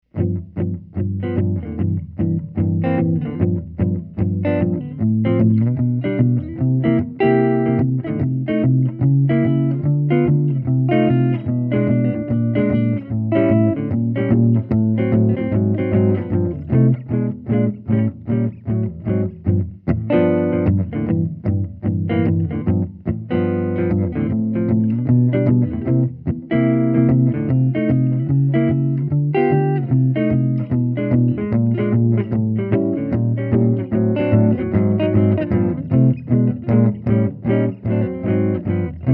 Jazz; Jazz Blues / (Standard, in G); Benedetto

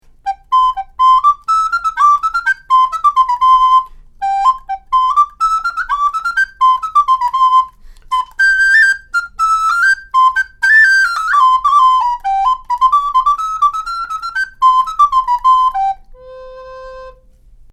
Flute

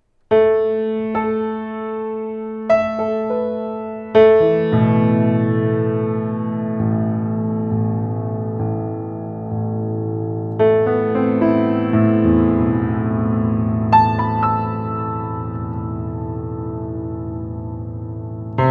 Piano

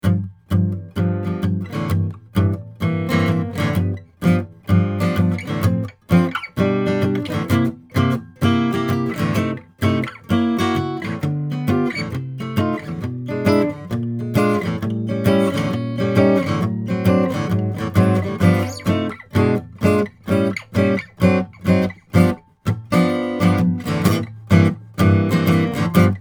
Jazz; SANTA CRUZ OM/PW AC. GUITAR; Neumann KM84

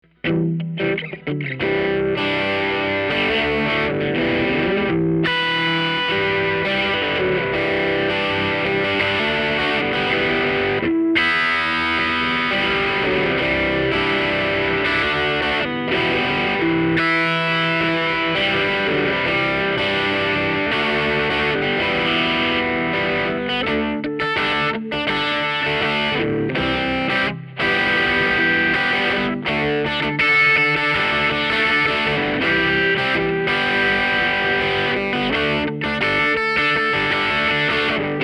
Harmonic; PRS 513

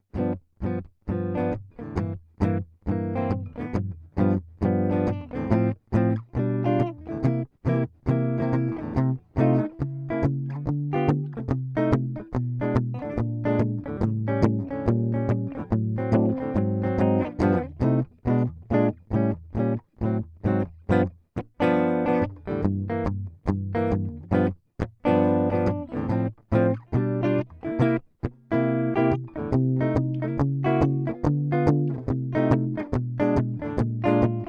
ES336 Jazz; Gibson ES336; Jazz Blues; Jazz standard

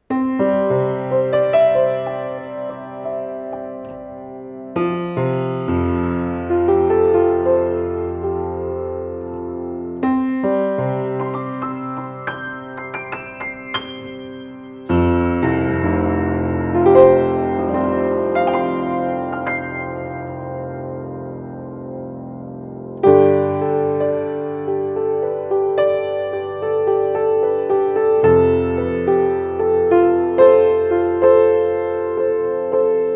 Piano

